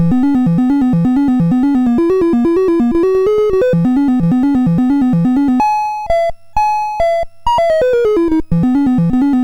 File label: sid MOS6581R4; Bouncer